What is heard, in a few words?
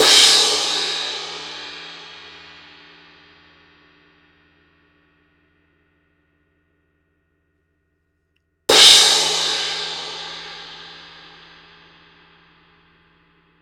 X-Series Crash, cymbals